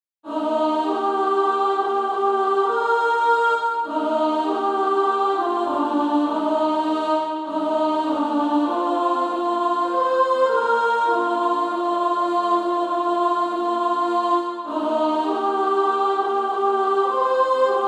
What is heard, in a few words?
Female Choir; A Child of A King; 2nd Soprano